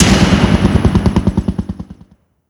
arcade streak lost; user interface; Call of Duty Modern Warfare

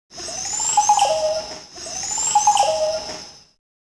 Jungle Bird; Ambient; Civ4 warlords

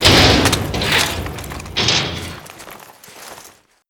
bigjump land; Modern Warfare

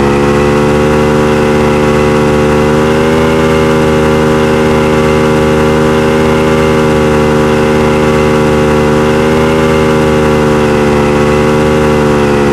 veh zodiac b sustain, vehicles

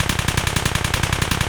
ak105 distant loop; Escape from Tarkov

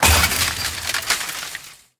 fence metal small crash; tanks; War Thunder

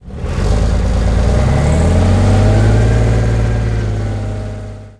chieftain engine load, tanks; War Thunder

